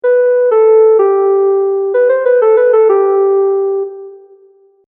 triplet variation

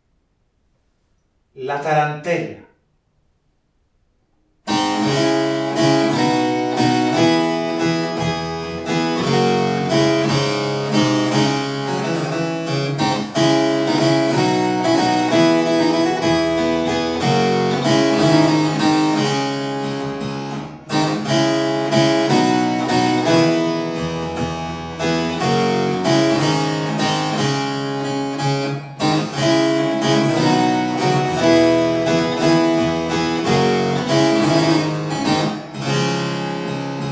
Tarantella 415

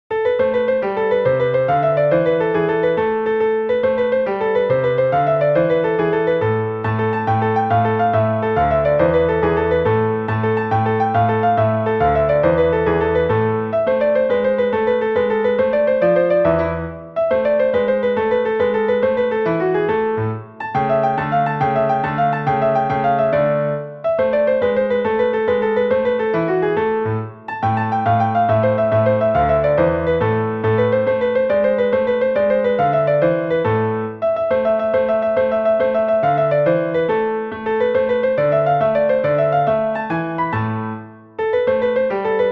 Tarantella clave; mss anonimo piezas para clave c 1700 bibl nac madrid

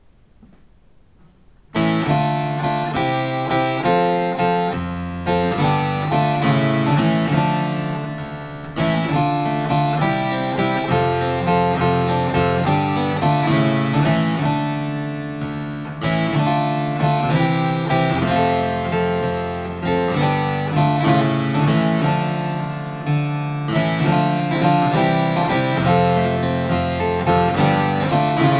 tarantella accomp

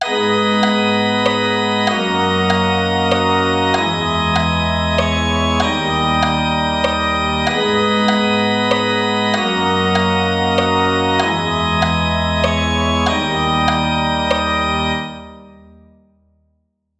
passacaglia a minor in 2 415; Passacaglia improvisatie begeleidingen

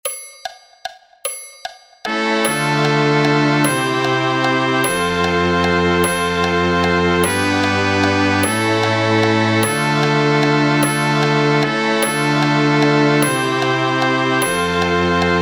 tarantella intro perc; Tarantella Accompaniment and Guidelines

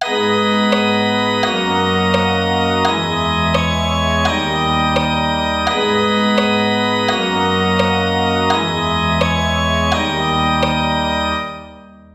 passacaglia a minor in 2 415; Passacaglia improvisatie begeleidingen

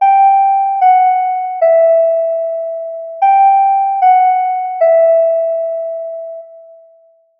minor three blind mice

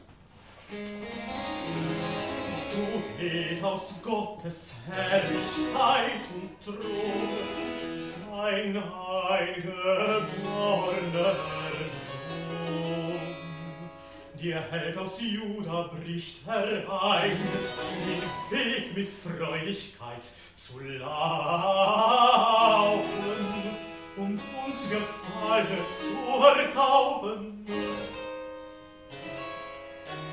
cantata recit; Scroll Ensemble; Workshop

